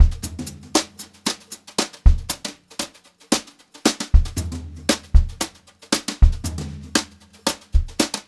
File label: Brushed Drum Loops, JAZZY, 116; USB Soundscan vol.39; AKAI; 90 s Sample CDs